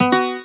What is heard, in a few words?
guitar